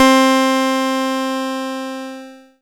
Casio MT-600 Synth Celesta C4

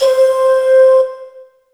Alesis S4 Plus Voice Lead C5

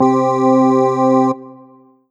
Roland PN-D10-01 Harmonic Organ C3

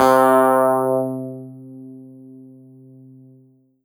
Yamaha EX5 Electro Clav C3

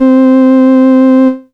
Yamaha PSS-280 Transistor Organ C4

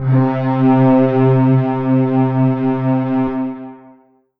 Yamaha TG77 Cello Plus C3

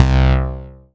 M-Audio Venom DCO Bass C2

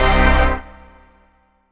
Ensoniq ZR-76 Hit Me Hard

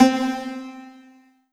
Kawai K11 Dulcimer C4

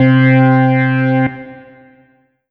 Yamaha MU90R 70s Rock OR C3

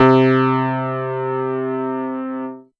Ensoniq ESQ-1 Piano C3

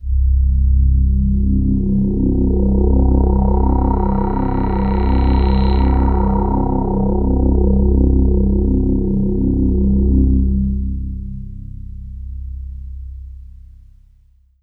Kawai K3 Sweep Pad C1

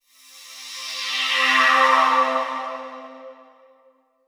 Roland GR-1 Sweep C5

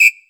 Whistle

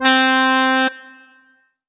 Korg NS5R Bandoneon C4

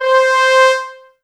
Kawai K1r French Horn C5

